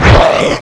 king zombie attack; incredi pack horror

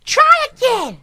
hunter pounce miss; huggy wuggy snpc; elmo

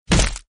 attack hit; sprunki simon pack

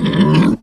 pain; The Monster Plane; kolmods preatt

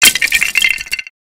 death; The Monster Plane; lego

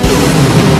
speaker spider shot; cameramen pack 1

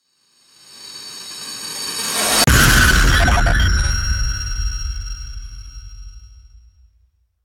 panic; Sprunki SecretHorror; horrorsprunkinume

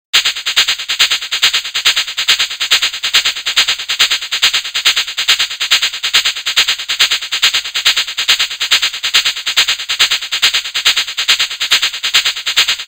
panic; Garry's Mod; sprunki horror no kill; horror sprunki green

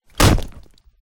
ground punch; clown; The Monster Plane; kolmods melee

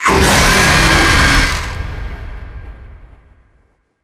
catnap jumpscare; zoochosis pack

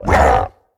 pain, procoptodon; zoochosis pack

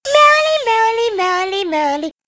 hunter stalk, idle; drg huggy wuggy snpc; elmo

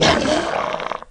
zombs death; The Monster Plane; kolmods death

